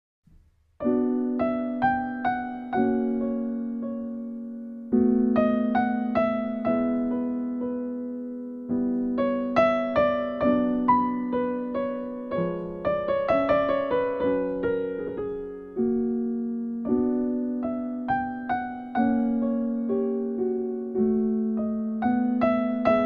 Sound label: rondo espressivo lamento